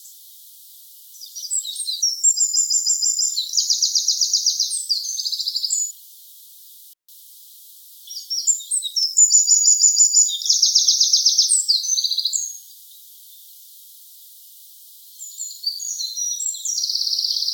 call, wren; This recording was made with an unattended automatic apparatus.; troglodytes troglodytes

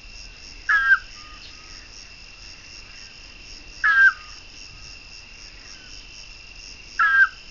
call, scops owl; Maybe this is a female call, because the frequency is about 1500 Hz, a definitely high value.; otus scops

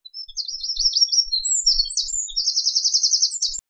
call, wren, full song, adult male; Poderone near Magliano in Toscana; ALTITUDE: +130 m.; troglodytes troglodytes; LAT.N 42 36'/LONG.E 11 17'

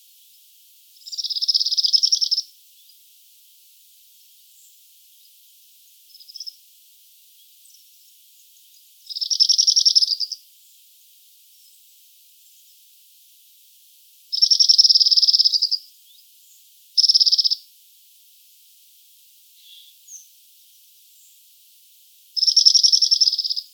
call, cirl bunting, Emberiza cirlus; bird might be a juvenile that is training and is improving its singing ability; This audio was made with an automatic unattended recorder

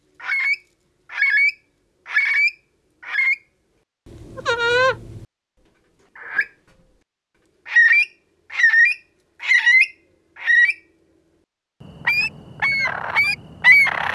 call, Stone curlew, kurlee call; Burhinus oedicnemus

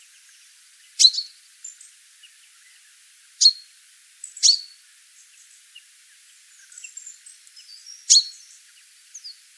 call, chaffinch; Note the slight difference among the four calls, which probably were uttered by different birds; Background: Chaffinch calls; Fringilla coelebs

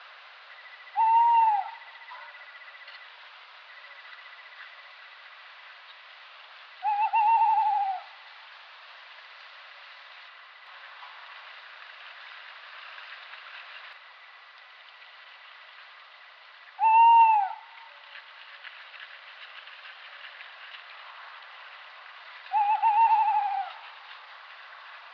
call, tawny owl, Strix aluco; Tascam DR100-MKIII; the recording was made in autumn, maybe the bird is a young Tawny owl searching for a territory.

